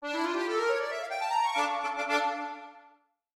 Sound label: accordion sting; Hollow Knight